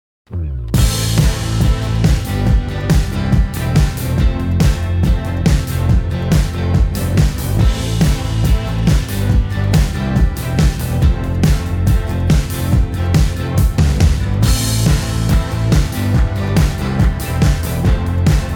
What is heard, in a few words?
brandsong ringtone instrumental; barmenia